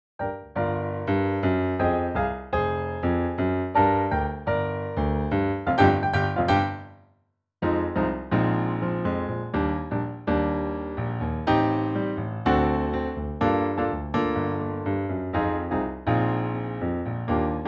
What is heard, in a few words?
Piano; Copa; Singing Audition Pieces; Stephen or Tony